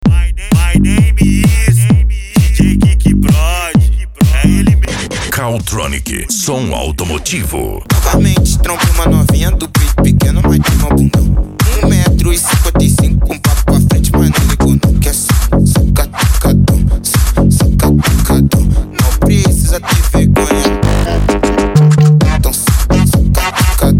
mega funk e funk mega bass

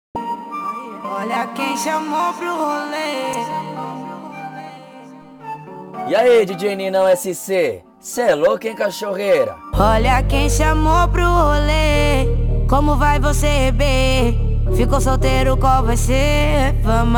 funk

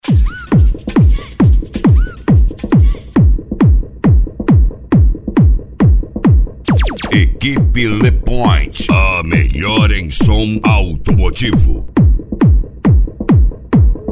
deep house

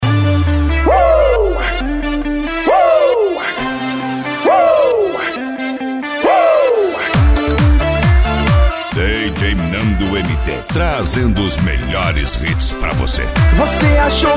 electro funk; na balada

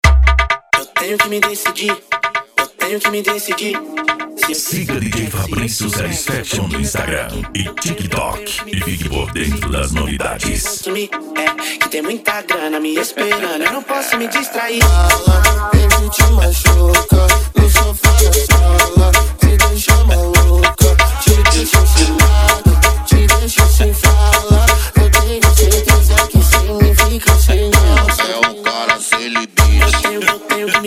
funk mega bass

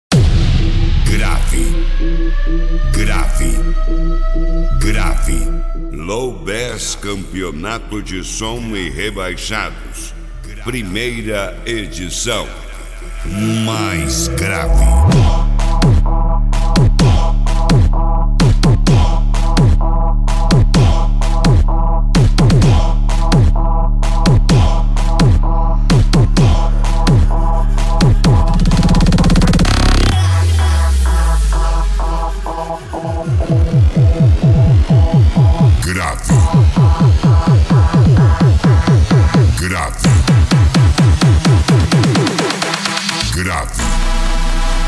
low bass

